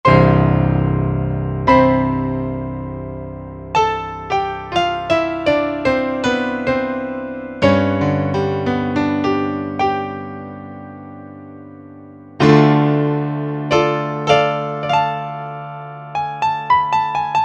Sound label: Grand Piano 16', Korg Wavestation A-D